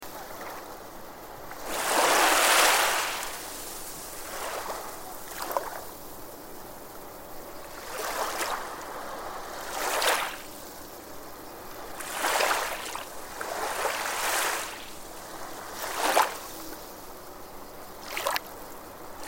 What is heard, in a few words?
summer beach; nature